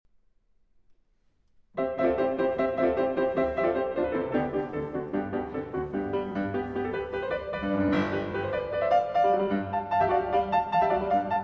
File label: Piano Concerto in C Major, Rondo. Allegro; Piano Concerto No. 1; Op. 15